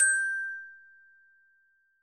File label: glockenspiel G7